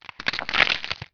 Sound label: Carn tear, Dino